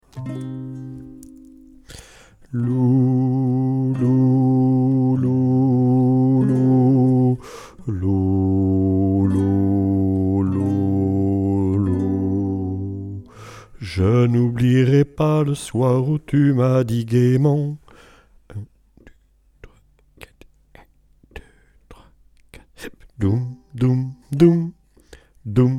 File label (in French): alto; chorale